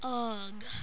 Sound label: UGH